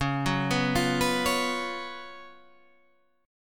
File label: Gb Major 7th Suspended 4th Db, guitar